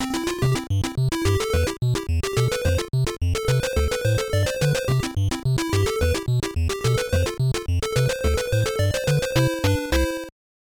Game Won; beetlejuice; nes

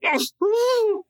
inmate pain

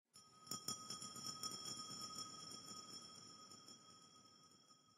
Amb Space race Crystals

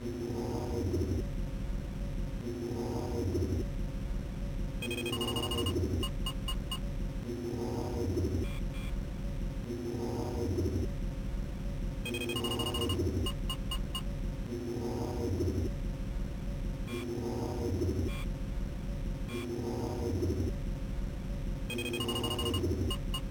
Ambience; Lilo & Stitch; GBA

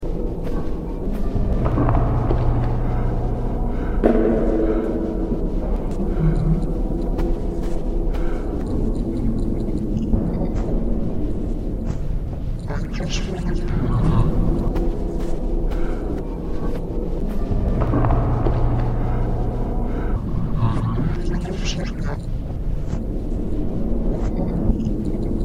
Fun Ambience; Backrooms 2D

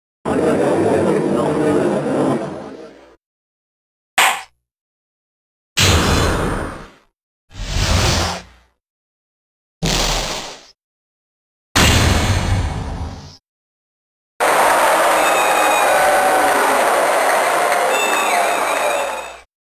Sound Effects - Courtroom Version

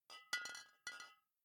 Bottle Shake; Devour; The Town; devour 2021 gamerip pc macos

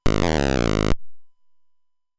Round Clear MUSIC; warp warp game sound effect arcade

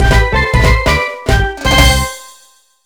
Reversi Win; 1500 ds spirits vol. 04 reversi 2007 nds

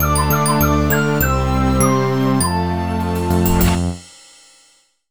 Jingle, BPM 100; Super Scribblenauts 2010 NDS; LVL1START

